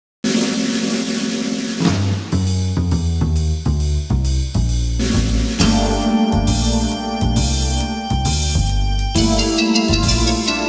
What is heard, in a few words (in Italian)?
suonerie musica jazz